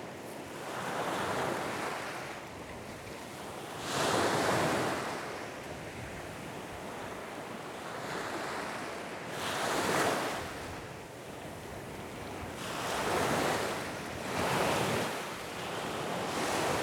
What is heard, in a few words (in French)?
Vagues